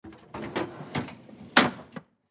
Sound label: Tool Chest Drawer Open; Tool Chest Drawer Open Craftsman; Hand Tool; Industry; Tools